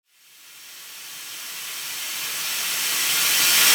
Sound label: 128BPM Reverse; Shockwave - Fx Elements vol 2